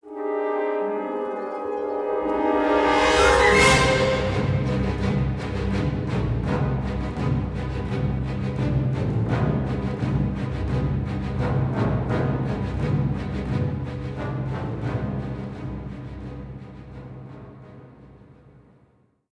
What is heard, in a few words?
intro, music flourish